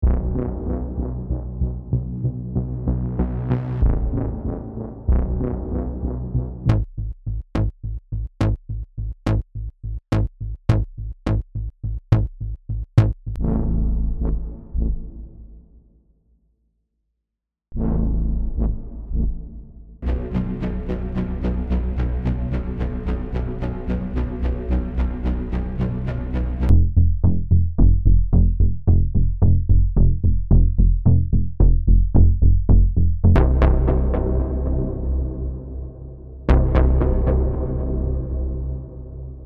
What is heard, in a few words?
Low Pulses